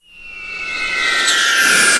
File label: mortar incoming; Explosions